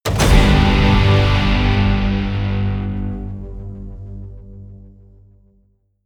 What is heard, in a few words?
promo pad